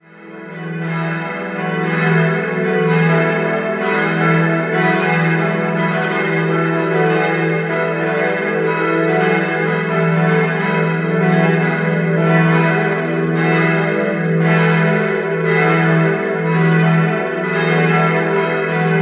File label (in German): glocken; Aschaffenburg Schweinheim Pius